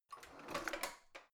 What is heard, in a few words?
old drawer creaky out half